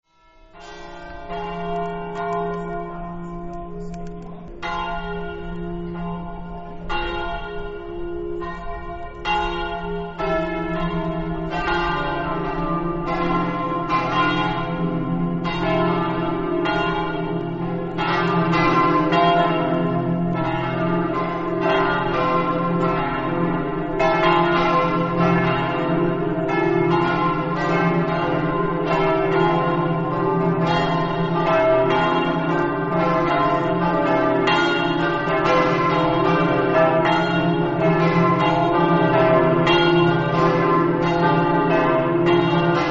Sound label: campane